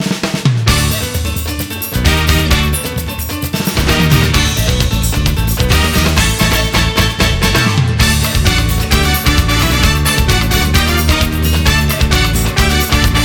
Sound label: Bonus; Super Monkey Ball Banana Blitz HD